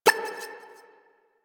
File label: Snake Game Move; Warframe; Nineteeen Ninety Nine